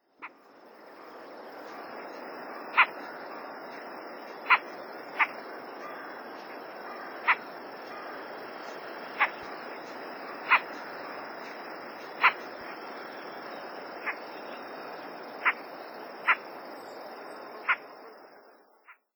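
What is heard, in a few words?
Siberian rubythroat, watarase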